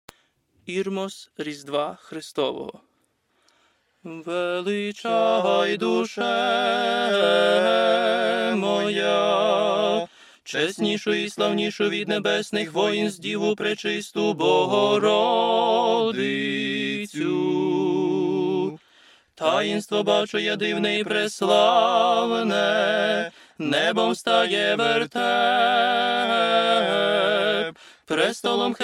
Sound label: Irmos Rizdwa Chrystowoho, chor; cerkovni napiwy